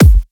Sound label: Kick F